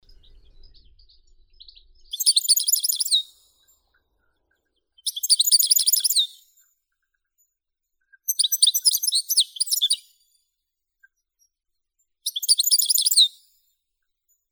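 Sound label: goldfinch, Carduelis carduelis; zvuki prirodi; shegol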